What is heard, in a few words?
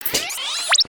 Broombot Idle, creatures mechanical; Inventor Broombot